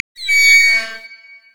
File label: brazier chains groan